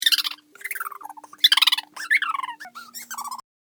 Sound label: Little Critters; Monster Sound Effects; horror sound effect